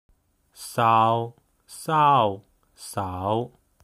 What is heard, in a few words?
saw